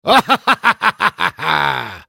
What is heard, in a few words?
laugh; Chronokeeper; Character Voices - English